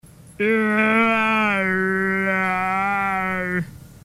eeaaarruughh

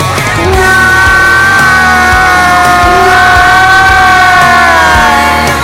mechanical cry

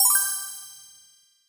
fairy sparkle

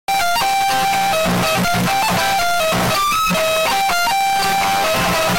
nokia alert

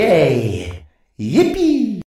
yay yippee

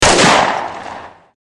pistol shot

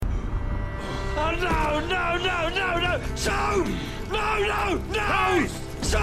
death; soap mactavish

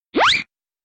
cartoon throw away

